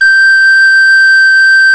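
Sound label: MOECK sopranino G 4; SoundBytes - Early Patches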